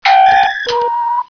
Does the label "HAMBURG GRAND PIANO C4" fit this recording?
No